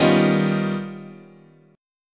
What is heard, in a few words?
D sharp Augmented 9th, guitar chord